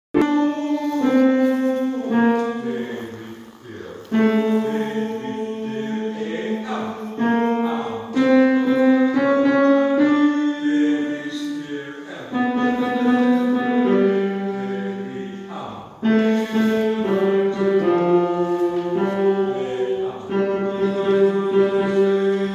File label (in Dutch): Bas